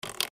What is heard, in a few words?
Plastic Knob Turn Clicks Dial; Foley